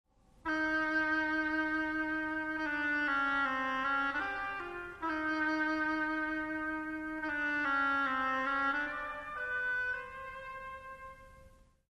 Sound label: oboe; Pierino e il lupo